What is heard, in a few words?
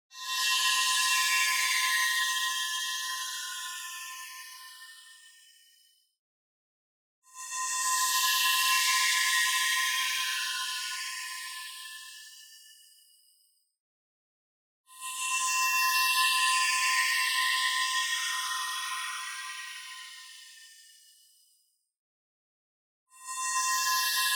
SHIMMER Synth Metal Phaser; boom library; Anime Essentials; DSGNMisc